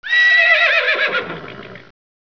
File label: western whinny; ambience